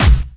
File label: bass drum, Drum & Bass